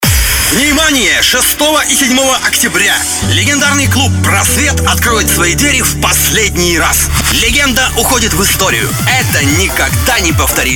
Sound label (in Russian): Radio Джингл. Просвет-закрытие